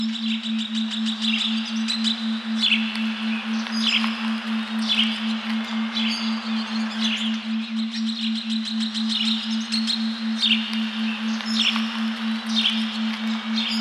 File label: Jungle Theta Waves 7hz; Loopable